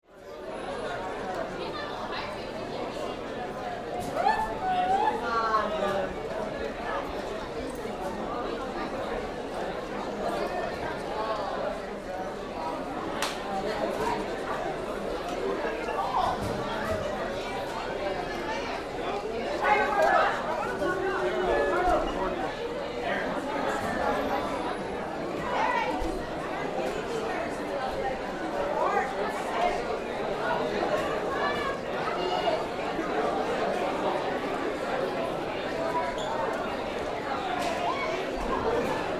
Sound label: High School Hallway, Ambience, High School, Hallway; Schools; Blastwave